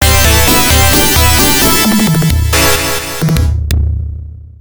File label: Game Over; Image Fight; gamerip arcade